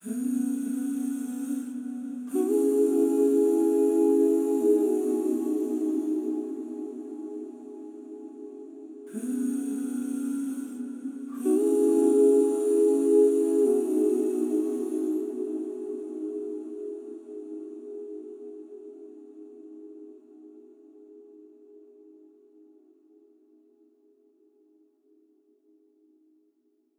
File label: Angels Vocal Wet - 106 BPM E Maj; Cymatics; Infinity Vocal Collection